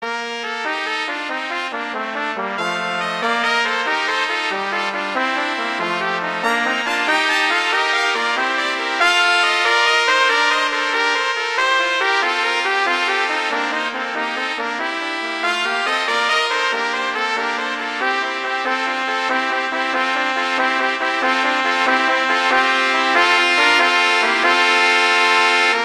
Gigue; Pachelbel; Canon

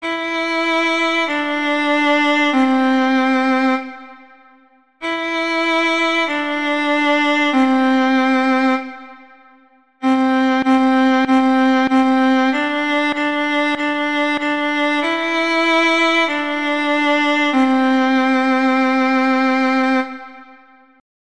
Violin Solo; Hot Cross Buns

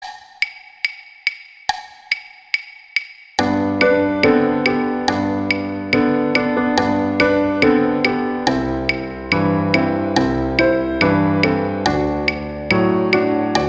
Jingle Bells; Christmas

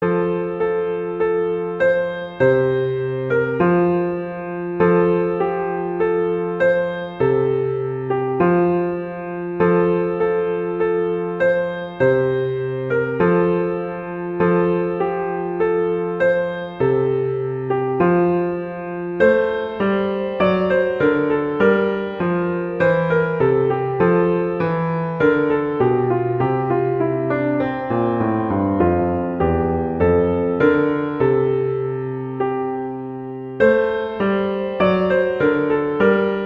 Angels We Have Heard on High, Christmas Beginners

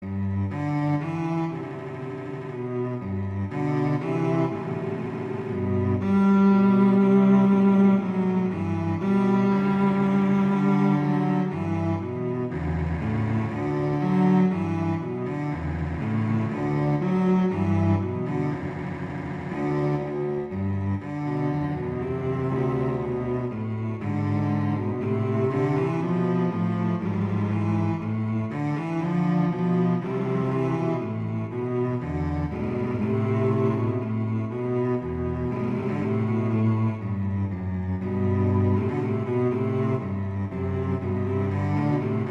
Larghetto; Telemann; Canonic; Sonata 2

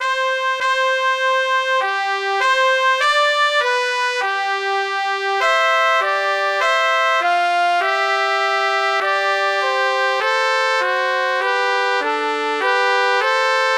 O Come All Ye Faithful Adeste Fideles; Christmas

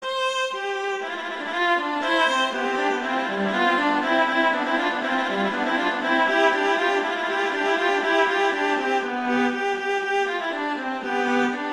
Allegro; Telemann; Canonic; Vla; Sonata 2

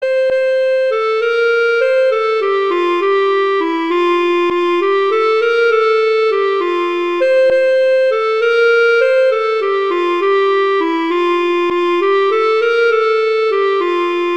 Sussex Carol; Christmas Beginners

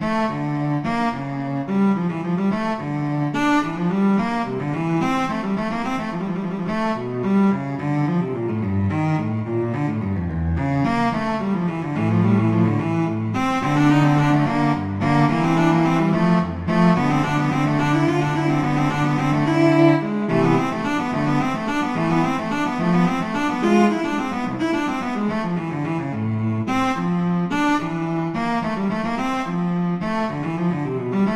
Gigue; Bach; Suite 2

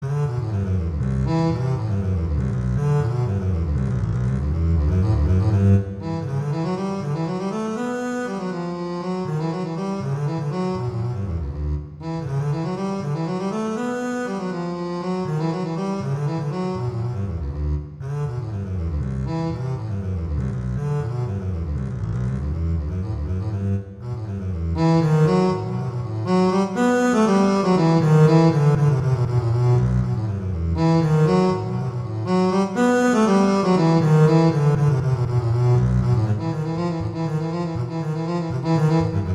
Waltz; Dragonetti; Waltzes

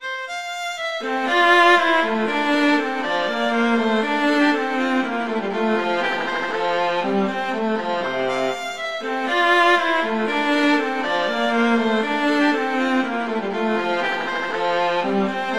Allegro; Telemann; Canonic; Sonata 1

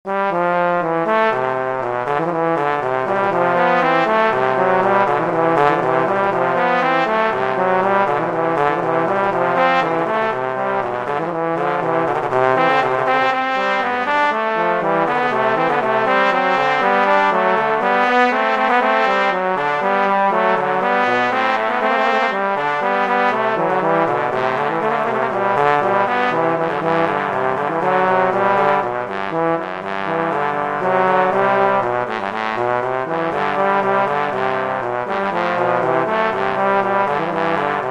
Allegro; Telemann; Canonic; Sonata 5

